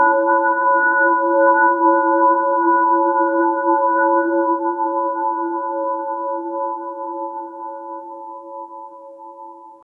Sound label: gentle final bell or pad